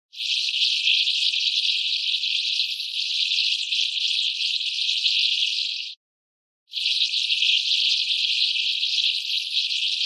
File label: Flailing Slimy Tentacles; Like John Carpenter S Thing